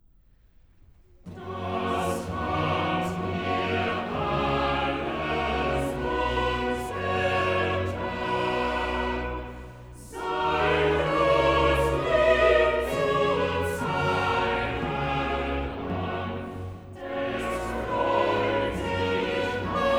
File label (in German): Choral; Cantata, BWV 91 'Gelobet seist du, Jesu Christ'; VI. Choral 'Das hat er alles uns getan'; Bach; New York; Xmas Day + 2nd Day of Xmas